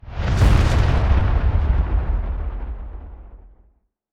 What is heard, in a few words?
kaiju titan muzzle fuel explosion; Stellaris; biogenesis; weapons